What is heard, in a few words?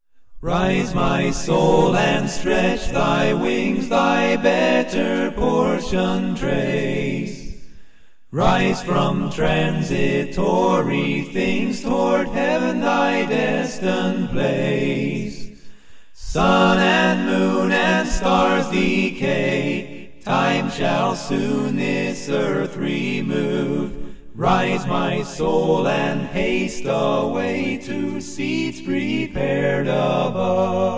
a capella hymn; rise my soul and stretch thy wings